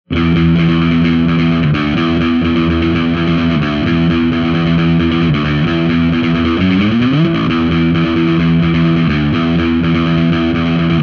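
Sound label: bass overdrive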